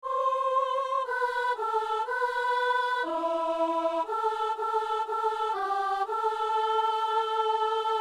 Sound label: soprano; Saint-Denis